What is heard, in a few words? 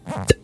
Plunger